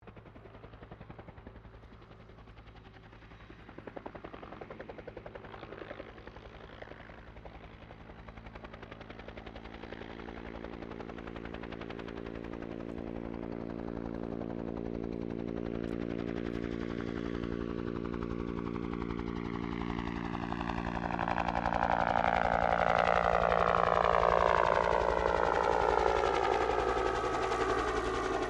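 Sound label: helicopter Bell UH1 Iroquois land shutdown; RAN UH-1 Iroguios; Helicopters (Sound Effects:Vehicles)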